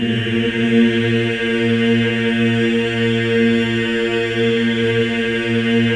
EES A#3, MEN EES OCT, Symphony of Voices; Spectrasonics

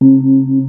R8 ETHNIC perc; Rubber Chicken Software Sample Bank; DRUMS + PERC

